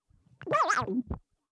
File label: baby vocal buzz; prosoundfx